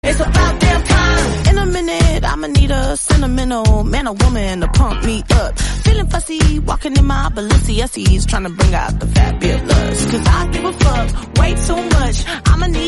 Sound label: theme english song